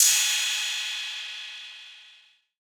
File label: cym